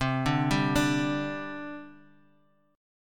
C Suspended 2nd, C, down, chord

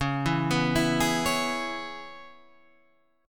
C sharp Minor 7th C sharp, chord; C#m7 Chord {9 7 9 9 9 9} arpeggiated

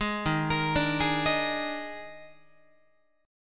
Eb Major 7th Suspended 4th Eb, Guitar; EbM7sus4; arpeggiated

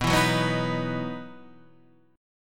E Major 7th Suspended 2nd

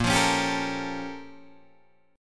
Bb Minor Major 13th Bb, Guitar, DADGAD; BbmM13; strummed; JGuitar

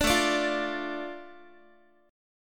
A Suspended 4th Sharp 5th down Guitar; Asus4#5; strummed; chordshape; 17,17,17,15,x,x

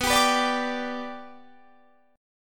B Minor Sharp 5th, Guitar, Standard; strummed; JGuitar

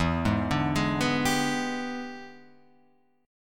E 7th Sharp 9th, Guitar, Open F; arpeggiated; chord; JGuitar

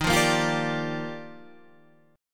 G Minor 7th, Eb; Gm7/Eb strummed; JGuitar

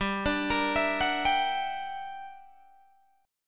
G Minor Major 13th, G, Guitar, DADGAD; GmM13; arpeggiated

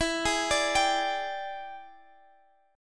E 7th Sharp 9th E down, guitar; arpeggiated

